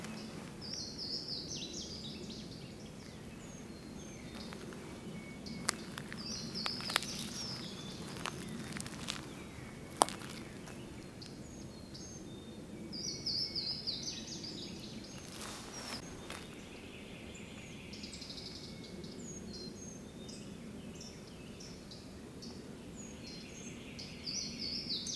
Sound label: Louisiana Water Thrush, Falls Lake; Rollingview